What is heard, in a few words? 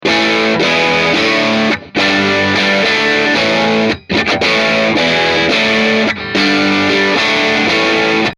Drive; MOLLER